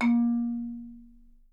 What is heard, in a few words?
A#2, Percussion & Harp; Miroslav Vitous - Percussion & Harp